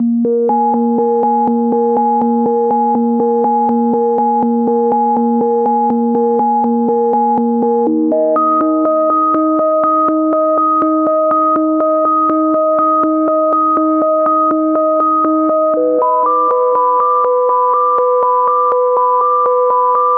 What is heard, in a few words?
122 BPM Melodic Arps A maj F min Tonic C; MMB